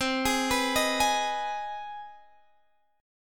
C Minor Major 7th Sharp 5th, Guitar, Half Step Down